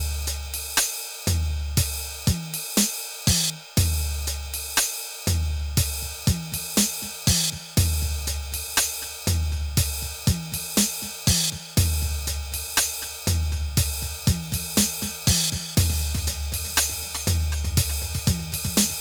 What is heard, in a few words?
NTS-3 Drum delay